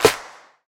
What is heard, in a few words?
short reverb acoustic snare sample f key